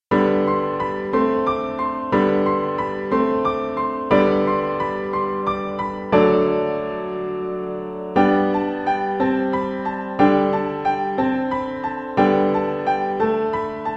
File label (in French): Philae, Violon et Piano